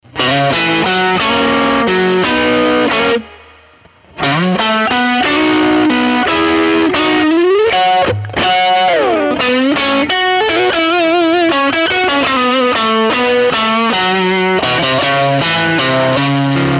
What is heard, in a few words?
Texas, pickups